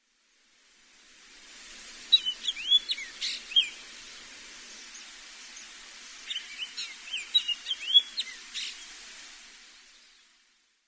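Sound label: Chinese Grosbeak, sakata; koe; sakata yanagawa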